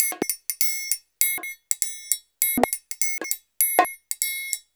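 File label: TRIAN, triangle, Modern Percussion Loops; Soundscan vol.56; AKAI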